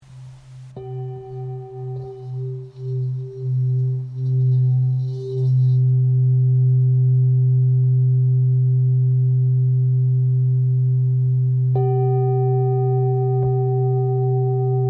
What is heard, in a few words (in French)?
Bol bengale do